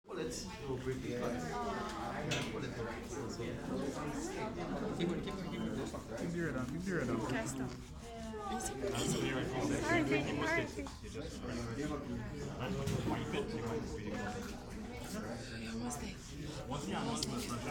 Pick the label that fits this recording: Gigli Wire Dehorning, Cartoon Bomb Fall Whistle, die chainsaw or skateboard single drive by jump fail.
Gigli Wire Dehorning